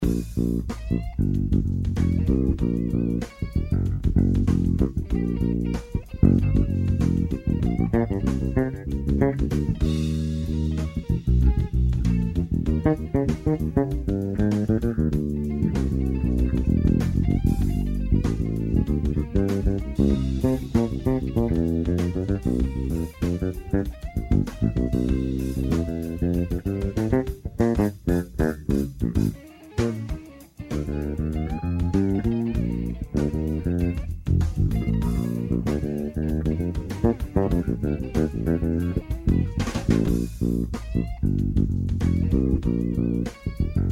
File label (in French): basse